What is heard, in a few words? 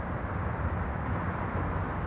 ambi town; Mafie 1